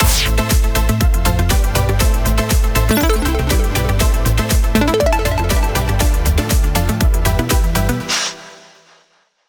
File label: superwin; Magic Stars 5; Wazdan